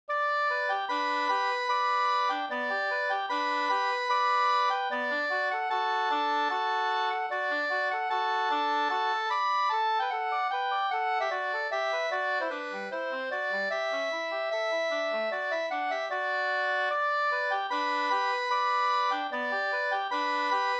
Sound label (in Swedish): vals; Osteraker